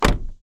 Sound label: Car Door Close